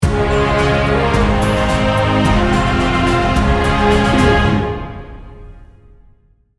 epic journey sting bumper

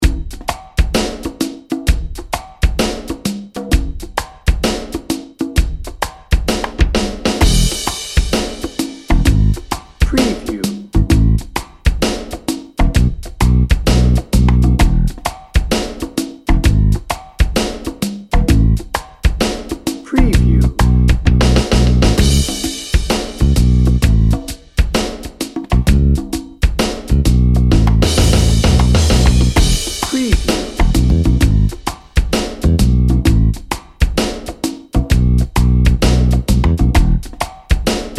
lazy day dnb

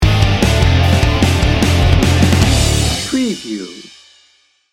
indie spirit sting bumper

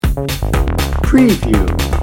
synthetic motion loop

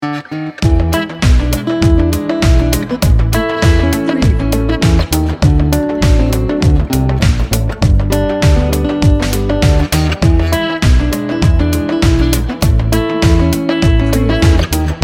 folk slide

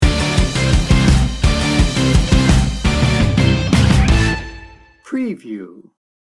xtreme power sting bumper

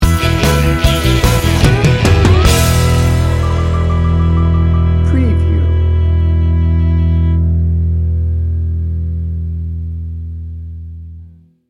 country bar sting bumper

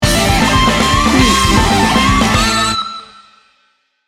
slow fist sting bumper

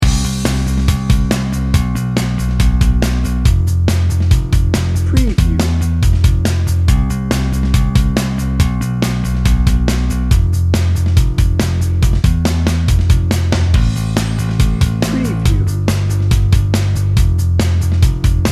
enthusiastic pop rock

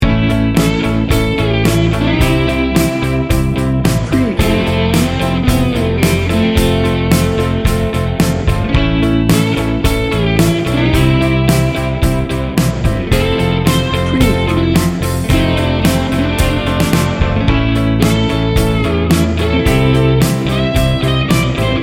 heartwarming

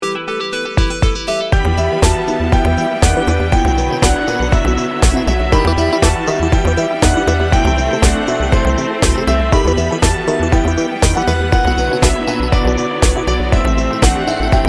bouncy and dreamy

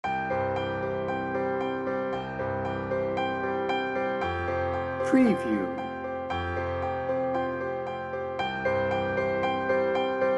flying high piano only

